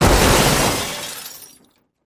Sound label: Car Crash